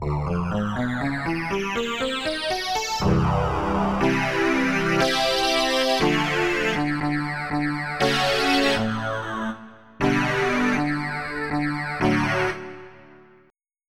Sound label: Fat Lead Stick